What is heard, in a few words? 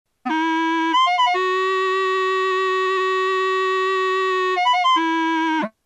yell clarinet